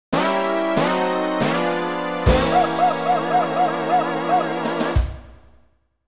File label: Finished Race Lost; Mario Kart 64; N64